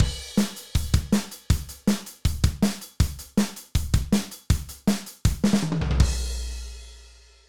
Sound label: hat